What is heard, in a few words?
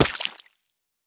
landing puddle; Escape from Tarkov